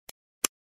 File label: Computer mouse long click